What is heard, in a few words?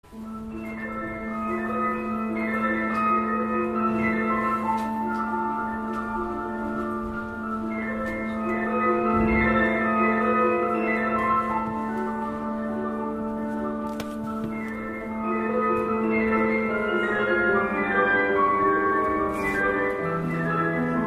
piano; Saint-Saens